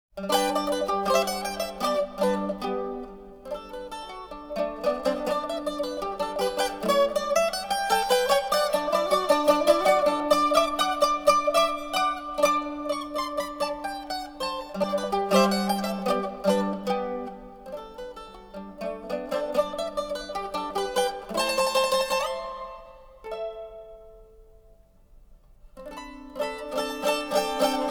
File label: mandolin